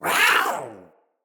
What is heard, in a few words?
Feral Vocal Attack Short; Catbrow